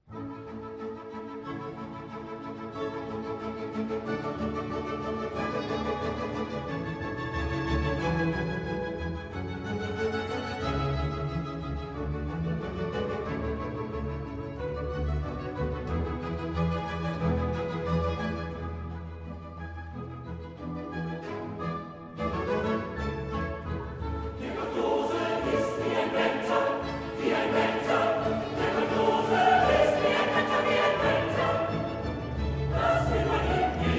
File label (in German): Chor; Der Gottlose ist wie ein Wetter